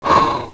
SIGH; dogz

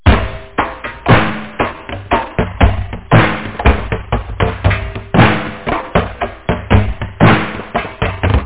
arabreakbeat; orient samples; forloop; BANG BANG BANG BANG BANG BANG BANG BANG BANG BANG BANG BANG BANG BANG BANG; Mecomp Multimedia 1

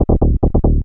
moog; Techno Music Maker; Bass

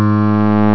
acid eats; Grid Warrior™ Demo

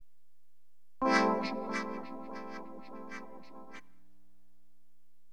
wah clav; HipHop Keyboards; LiveAct Pool 1

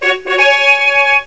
victory; Keep of the Demon Lord

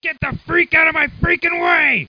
yell

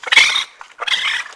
RACCOON; animal; animal fun

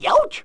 ouch

